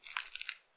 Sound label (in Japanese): 膣外射精